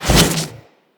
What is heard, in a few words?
Melee Jump Slam Large Charge; Whooshes